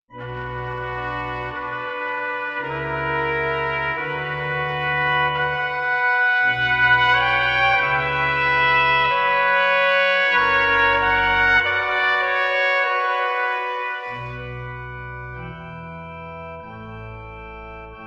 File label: Grave Concerto in D Major, Horn and Organ; Jean-Baptiste Loeillet